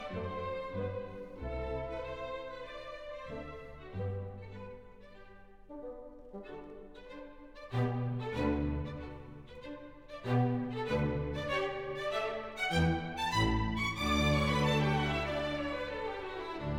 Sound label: Symphony, Chamber Orchestra; Hob. I:101 The Clock; II. Andante; Chamber Orchestra of Europe; Claudio Abbado